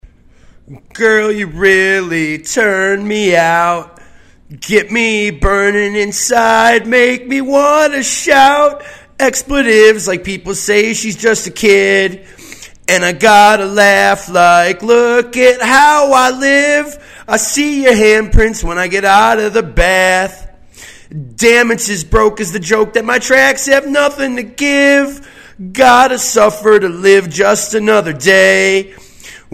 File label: vox